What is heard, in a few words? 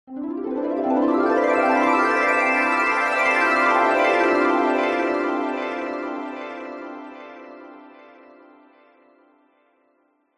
sound design magical rising falling twinkling nostalgic